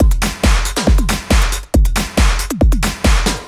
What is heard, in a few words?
Dance Drumloop